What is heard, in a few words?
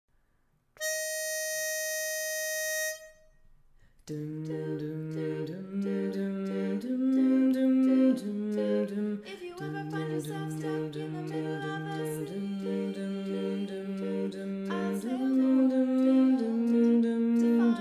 Bass; Verse 1, Chorus 1